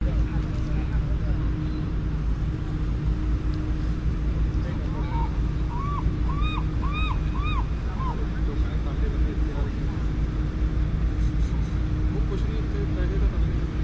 market dock seabus seagulls boats planes water pedestrians walla; vancouver; lonsdale quay; your free sounds